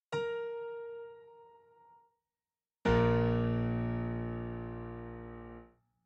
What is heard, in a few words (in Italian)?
Frammento finale per pianoforte